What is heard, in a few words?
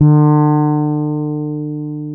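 fretless D3, bass; InVision Interactive; Lightware VOL-1; Instruments & Percussions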